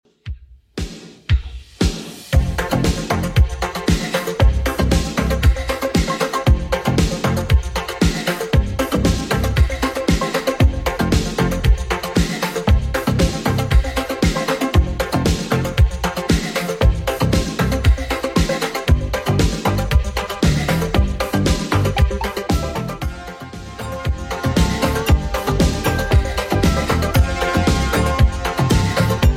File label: 80s Redrum, 116 bpm